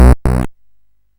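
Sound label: Nebulus, MOS 8580 SID chip music, games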